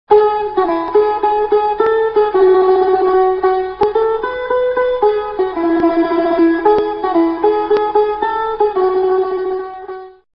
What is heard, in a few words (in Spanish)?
laud; tradiberia